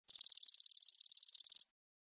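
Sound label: Insect Clicks Warning Threatening - Rattle Snake Type Rattles - Stag Beetle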